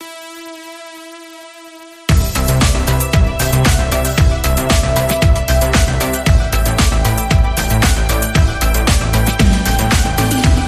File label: Space Synths, Rhythm